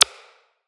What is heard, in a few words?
Button Press